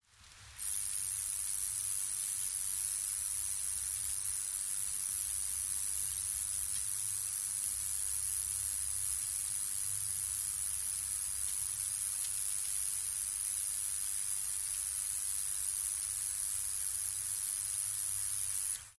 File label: roesels bush cricket